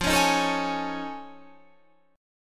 F 7th Sharp 5th Sharp 9th F; F7#5#9 strummed

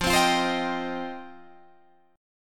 F 7th Suspended 2nd F Guitar Open D

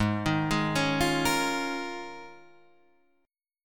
D sharp 7th Suspended 2nd Suspended 4th G sharp

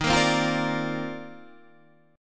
C Minor 11th D sharp

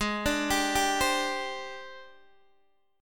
G sharp Major 7th Flat 5th Guitar Open D; x,11,12,13,10,10

